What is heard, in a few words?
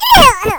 pain; faerie